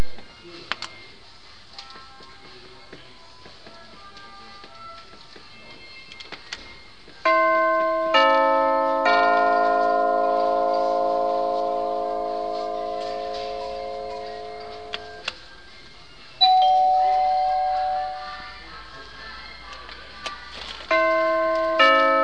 testing door chimes at the diy store

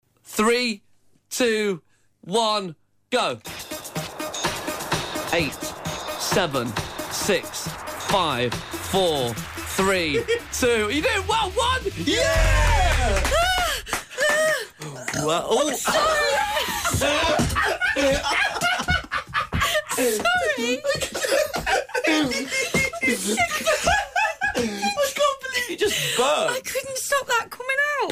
burp

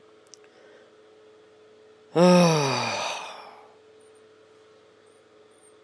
sigh

